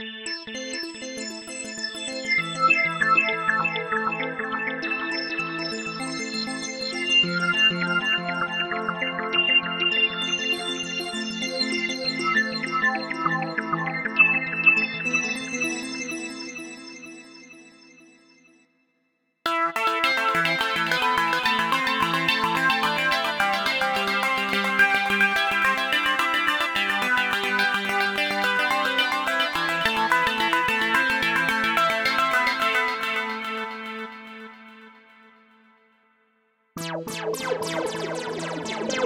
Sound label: arp